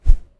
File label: Punch Miss Whoosh